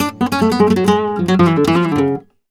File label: c#, Flamenco Guitar Picado; Picado 2